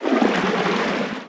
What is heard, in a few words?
creature penguin swim glide